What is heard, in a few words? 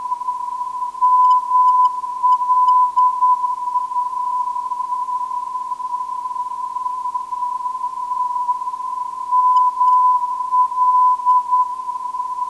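non-directional beacon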